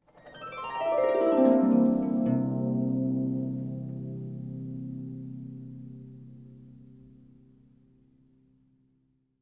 Harp down glissando

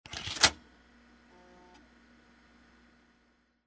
Inserting Floppy Disc

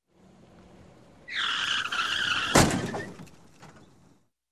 Car Crash with Brakes Sound

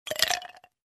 Filling Ice Cubes in Cup

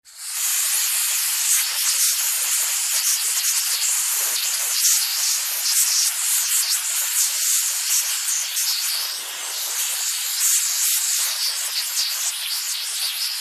Air Compressor Tank

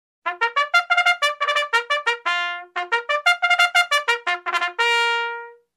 Trumpet race start melody

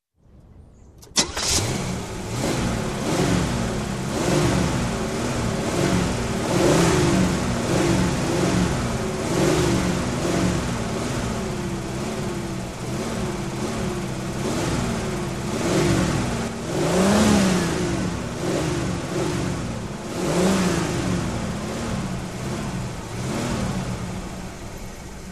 Car engine ignition running

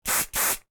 Hair spray double

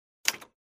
Typewriter Key Press

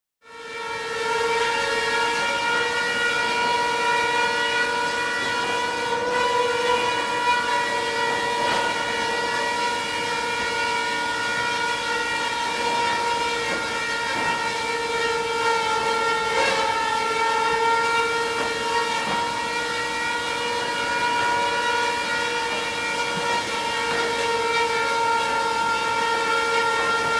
Forest Warn Signal

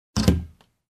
Cabinet Door close

